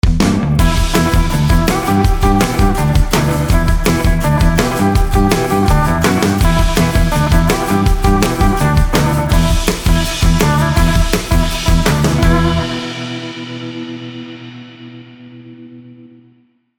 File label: Pop Up Podcast Intro Short